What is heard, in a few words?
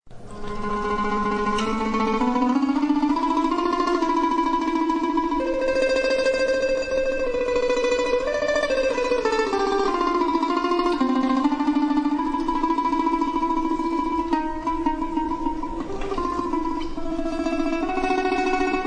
russian dance domra